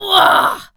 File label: attack, Zombies; zps; Z Waitress